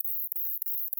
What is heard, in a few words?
song, katydids, neduba; neduba sequoia